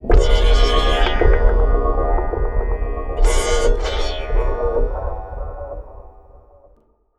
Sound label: Moog LPF & Delay, contact mic, Wire & Tin